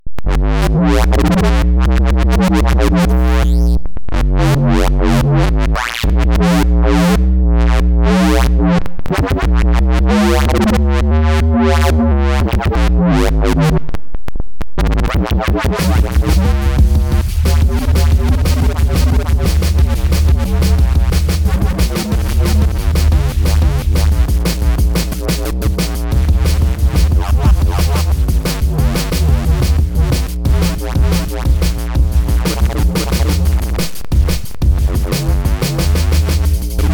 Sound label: Dubstep